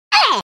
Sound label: whomps